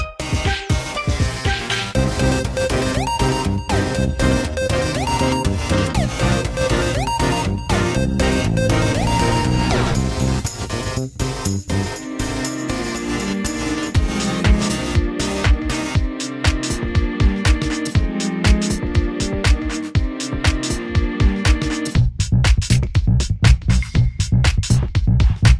Upbeat Electro

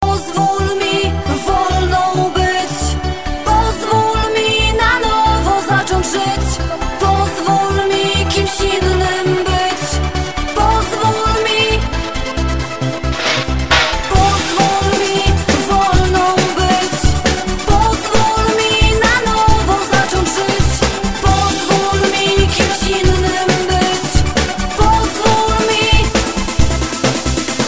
Preludium

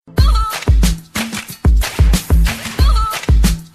Woohoo

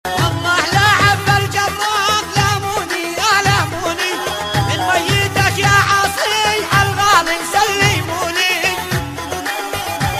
Arabic

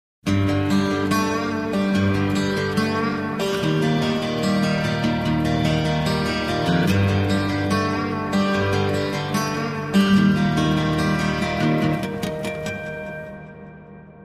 Guitar Solo

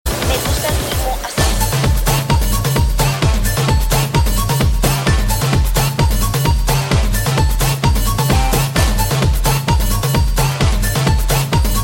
Tribal

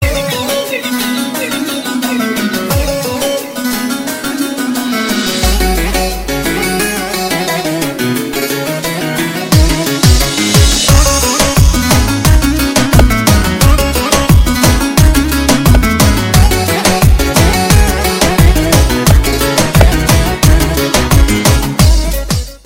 Nice Romanian Music

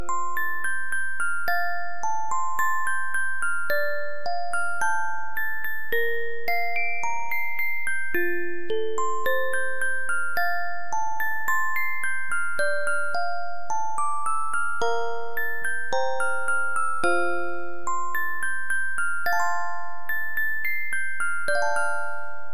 Music Box

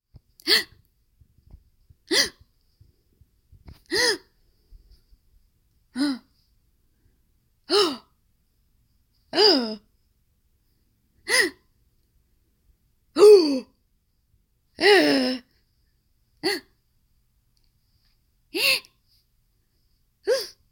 Various gasping

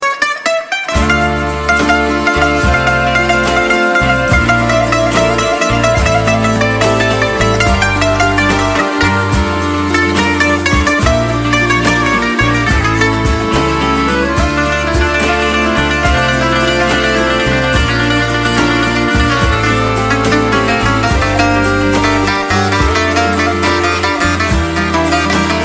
Music ringtone

